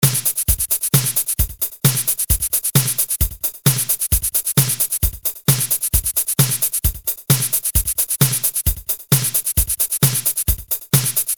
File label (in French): batterie